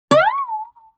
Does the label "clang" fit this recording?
No